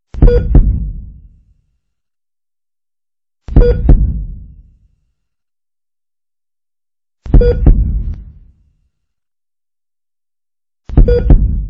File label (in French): Battements de coeur